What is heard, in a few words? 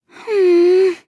"mmm"; shirokuma